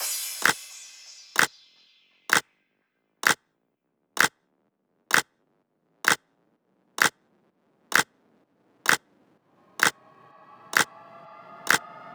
128BPM, House Electro Fills; Catchy 6; Vengeance Samples

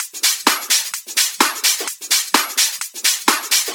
House Loop, 128 BPM; Vengeance Samples

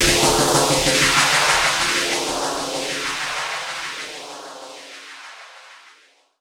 FX Downlifter

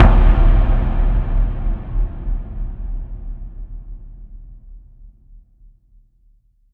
Hits & Explode

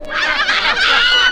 Ambiences, Places and Ambience

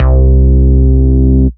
Ultimate Bass Root C; Vengeance Samples

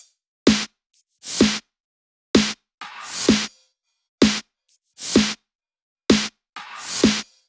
128BPM Kit; Vengeance Samples; VFH1 128BPM Tron Trio Kit

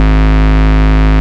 Bass, Root G#; Vengeance Samples

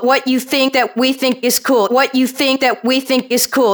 House Loops, Vocal Loops House 128BPM; Vengeance Samples

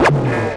Acid Sounds D#, Synths; Vengeance Samples

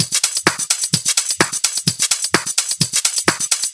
Shaker Loop, 128 BPM; Vengeance Samples

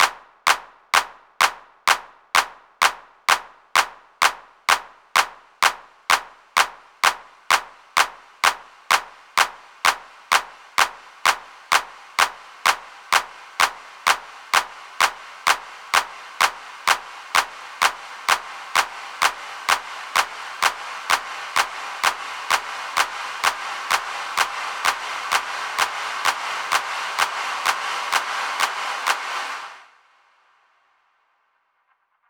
128 BPM Spooky; 16Bars; Vengeance Samples; House Electro Fills

